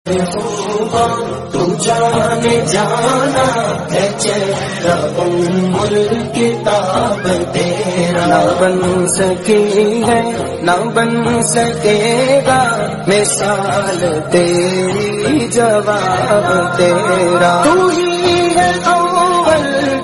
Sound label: islamic